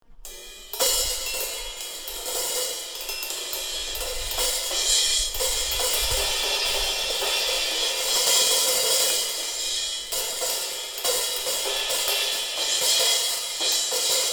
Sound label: Drumset